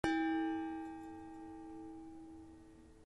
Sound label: bellplate suspended